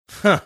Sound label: "huh"